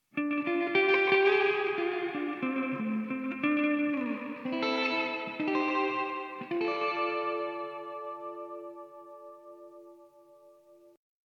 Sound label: les paul reverb voll